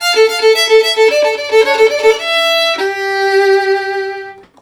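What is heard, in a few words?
VIOLIN LOOP, Asian Traditions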